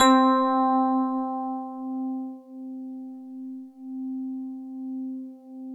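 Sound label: FM electric piano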